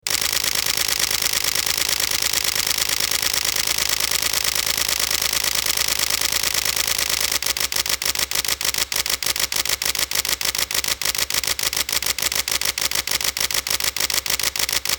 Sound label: jpeg FX burst, nikon d3100